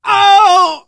hurt major, survivor voice, manager; source engine; left 4 dead 2

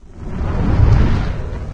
whoosh huge, near miss; Source Engine; video game; physics

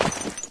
player gravel; Source Engine; dod

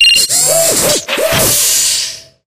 sphere socket attach; Source Engine; Portal 2

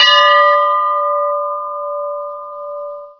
brass bell d; ambient; Source Engine; cstrike

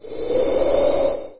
exit windows; sound schemes; mystery; windows 9x

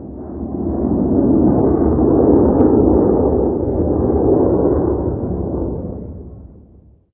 plane, ambient overhead; Left 4 Dead 2; Source Engine

